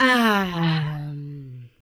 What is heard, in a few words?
VOX, VOCALS; Ueberschall; Jam Box